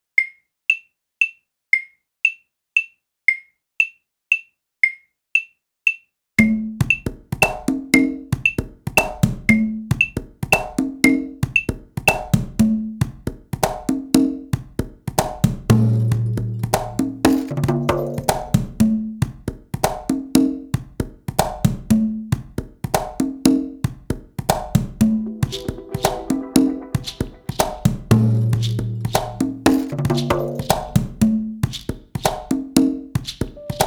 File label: 116 bpm (triplets), contemporain musiques